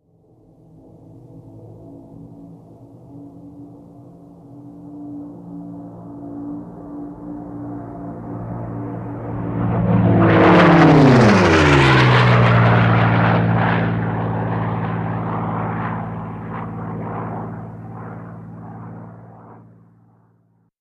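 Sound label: Plane P51 Pass By Fast; ww2 fighters; p-51 mustang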